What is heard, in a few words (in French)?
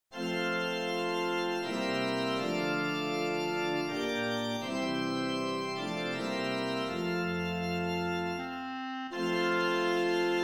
orgue 3 voix; Isis et Osiris Mozart